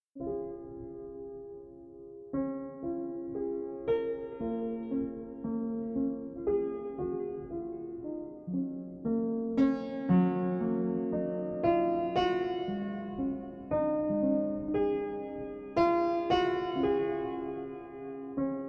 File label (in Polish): pozytywka, 432 hz